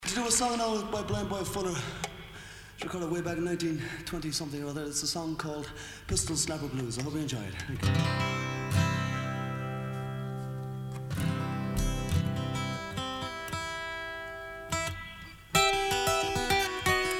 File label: Blues